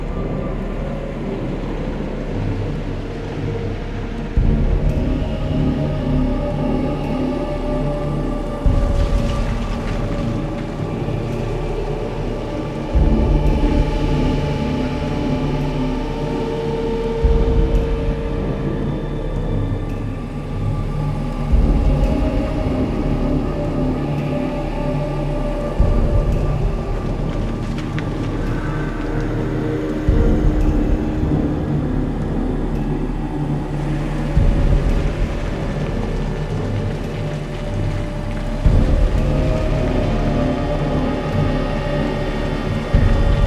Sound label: tense caves; Underrail